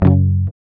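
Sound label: GUI F#, acoustic guitar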